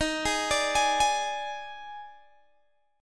D sharp Major 7th Suspended 4th D sharp Guitar Open G, chord; x,20,18,19,21,18; chordshape